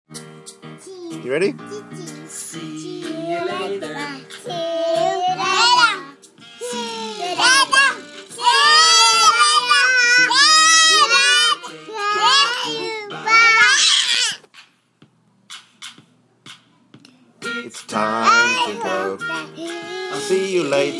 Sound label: noisy see you later song